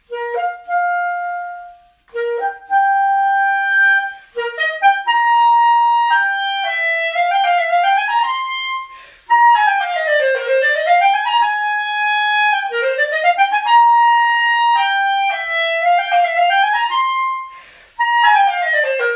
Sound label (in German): klarinette